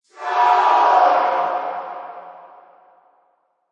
Score, Basketball Chant Score. Indoor Sports: Crowd shout; Indoor Sports: Crowd shout "Score!". Reverberant.